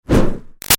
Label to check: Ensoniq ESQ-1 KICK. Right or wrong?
Wrong